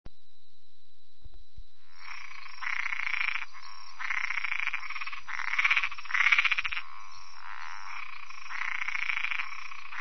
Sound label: Boana albopunctata